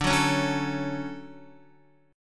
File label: D-Major 7th D down Guitar; strummed; JGuitar